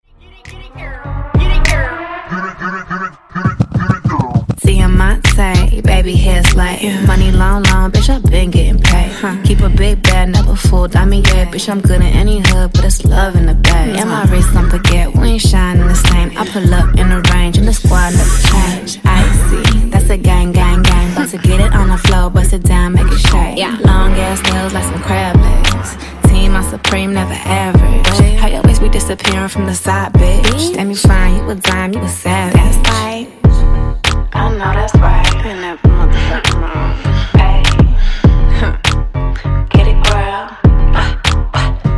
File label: Funk Tone Play Transition 100 83 Bpm